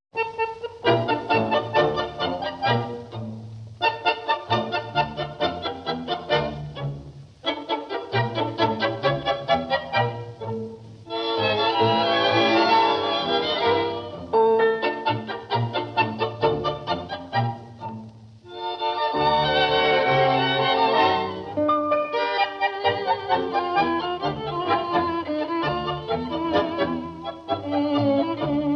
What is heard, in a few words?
tango